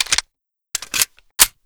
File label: colt reload; weapons